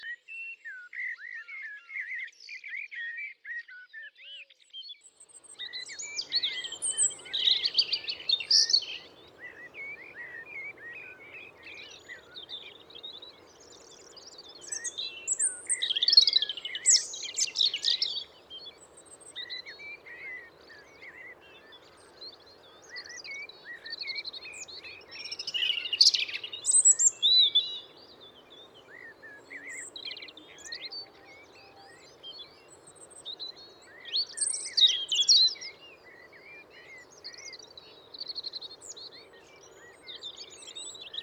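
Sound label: robin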